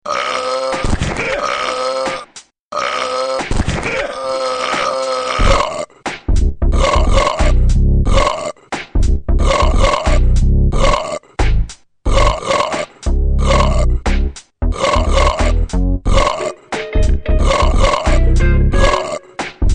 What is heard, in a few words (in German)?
ruelps rap